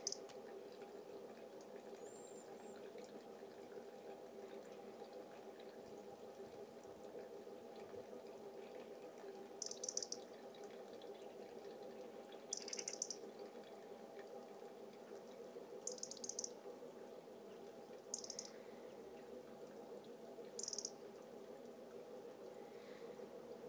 garden soundscape including washing machine spin cycle and robin